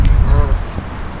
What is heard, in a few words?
Goose; Birds